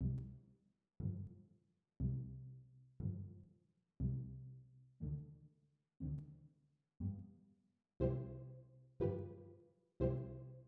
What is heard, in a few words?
jv accomp